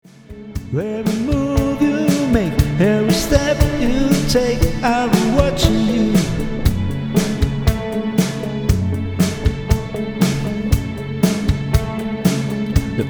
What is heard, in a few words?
Bass